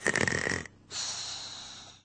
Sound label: sleeping; roblox